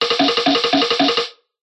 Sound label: cartoon run scramble fast wooden vintage